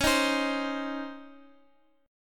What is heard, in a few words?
C sharp Major 7th Suspended 2nd C sharp, Guitar Open F; strummed; chord; x,x,x,8,6,7